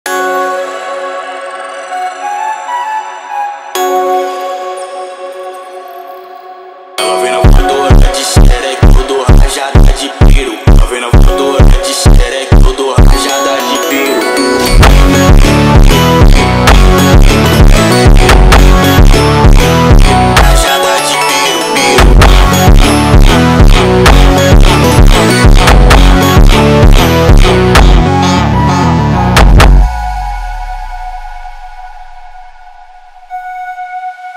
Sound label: Brazilian Funk Phonk